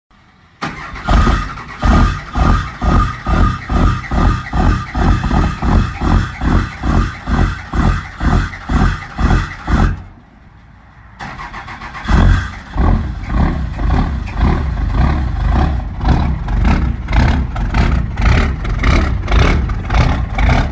Cummins cold start

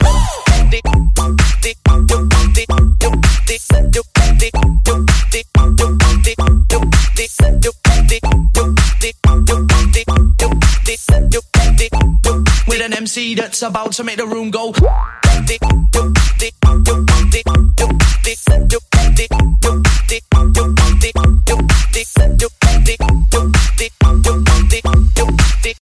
best electro rington

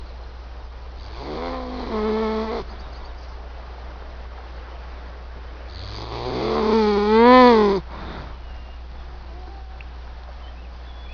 Beaver Sounds

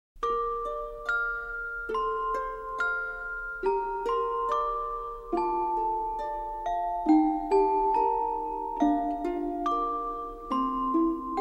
Lullaby

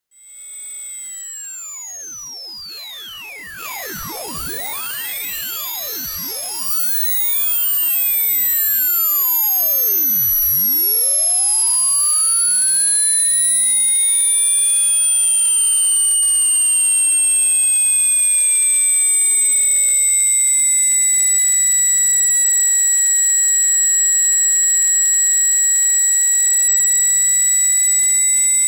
100000 Hz

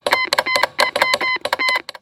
diplomacy; Hoi4

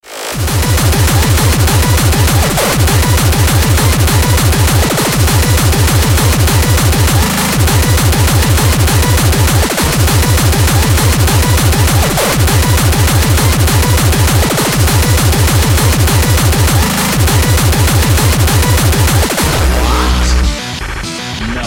Heavy Bass Techno

